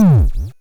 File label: Drums, Hits; DCM8